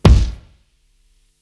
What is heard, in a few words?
Kick, Psychedelic Drums